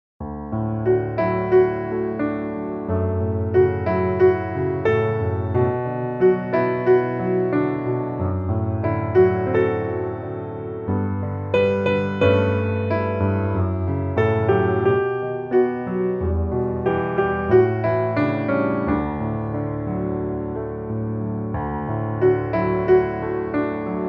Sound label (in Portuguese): piano